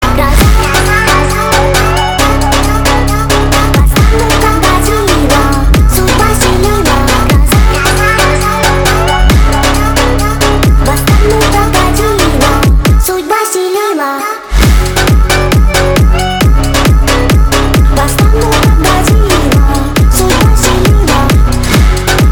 phonk remix

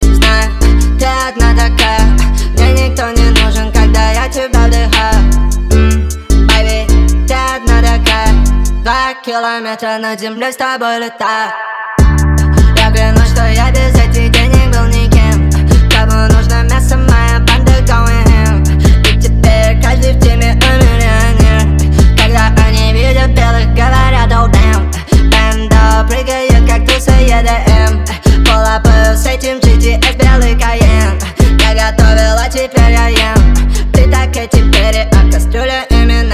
edm

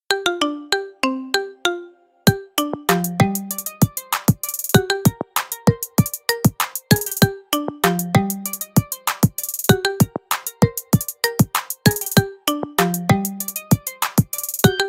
theme song marimba remix; gta san andreas

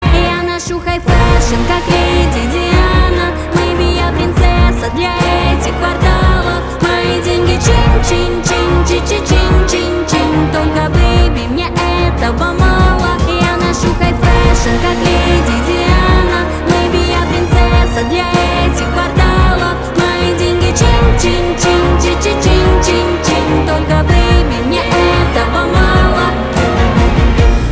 orchestra performance

